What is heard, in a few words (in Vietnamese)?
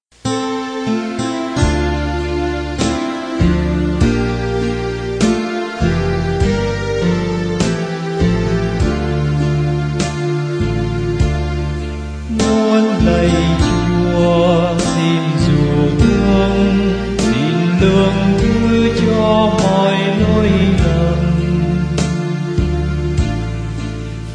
BASS